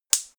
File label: gas stove hob ignite single; gastronomie; berufe